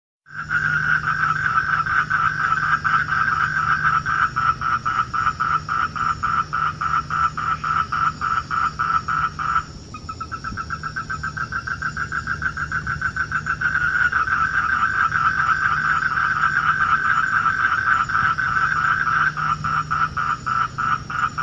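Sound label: duttaphrynus melanostictus, Asian Toad call; Bufonidae; Singapore, February 2018, near sea-level.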